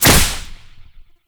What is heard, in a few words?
Morph Impact; Warframe; Khora